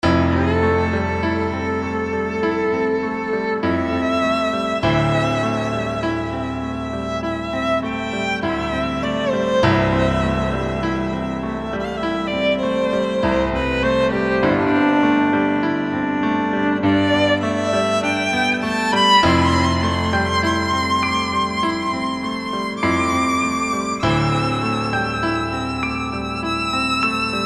Smooth Keys and Violin

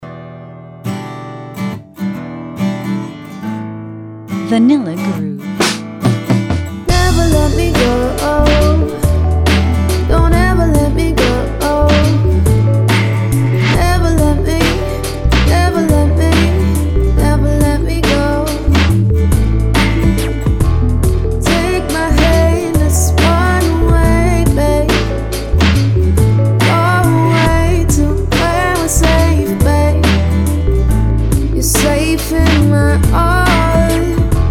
Silky Smooth Vocals

